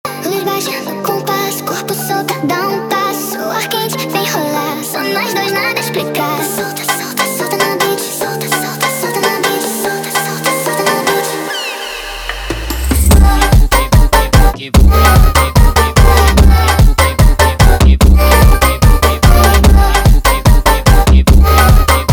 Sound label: sped Up, funk